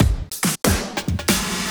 Fills, Loops 140 BPM

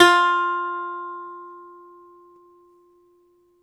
BALALAIKA, Russian Strings

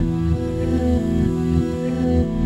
Chant, Acoustic LoopChant; Sonic Foundry (Sony Creative Software) - Friendly Fire; DroneLoop

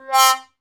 BRS BONE, Trombone; Roland L-CDX-03 Disk 2

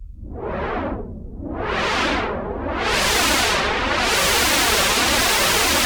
Juno-60, Filtered Noise; noise hiss crackle

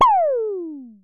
Synare Filter; Star Instruments Synare 3

